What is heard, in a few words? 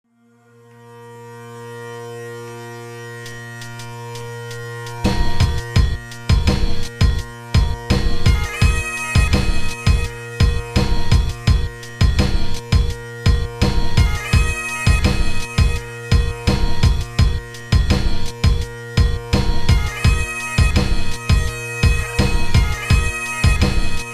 bagpipe stomp; Puppet Macbeth; Kronauer Space at Adams House (Harvard University)